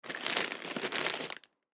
Ambulance Supplies, Ambulance Supplies Grab From Cabinet; Emergency; Emergency Vehicles